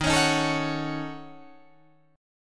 Eb Minor 7th Flat 5th Eb down Guitar Drop D

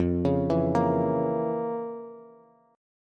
F Major 7th Suspended 4th Sharp 5th F, Bass; FM7sus4#5 arpeggiated; JGuitar

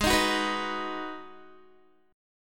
G sharp Minor 7th, Guitar; strummed; 15,18,18,16,15,x; 4F,4A,5C,5G,5C,6E; G#m7

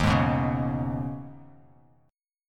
Eb 7th Suspended 4th Sharp 5th, Bass Standard; Eb7sus4#5; strummed; JGuitar

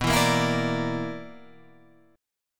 B-Minor Major 7th Double Flat 5th

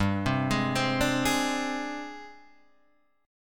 G sharp Major Sharp 11th G, chord

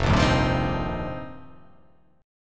F 9th Suspended 4th F, chord; strummed; JGuitar

